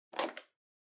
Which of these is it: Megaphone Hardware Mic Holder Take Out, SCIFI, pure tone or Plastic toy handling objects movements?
Megaphone Hardware Mic Holder Take Out